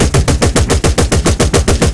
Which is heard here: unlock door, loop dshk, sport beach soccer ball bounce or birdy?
loop dshk